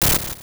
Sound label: Boss Hit; Sonic WindowsZone